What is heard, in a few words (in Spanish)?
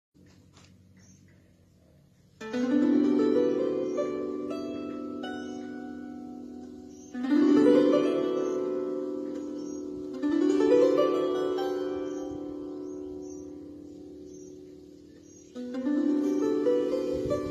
Arpa Lira